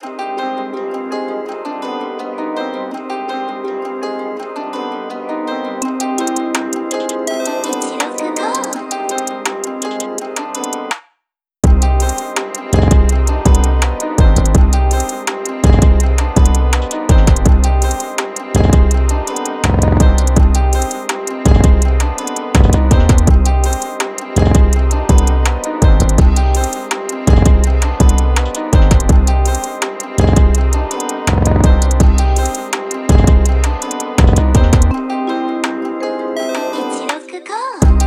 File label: Cm BPM165